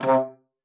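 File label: C3, bass flute; Xsample VOL-2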